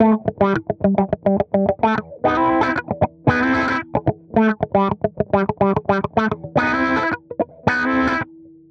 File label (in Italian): compresore